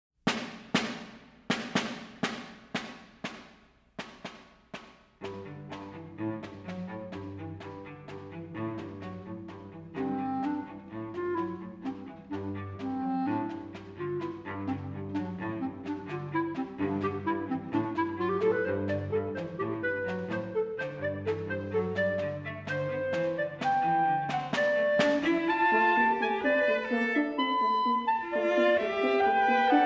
Quartet For Clarinet, Horn, Cello And Side Drum Allegro Moderato